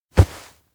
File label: chest thump hard; anemoi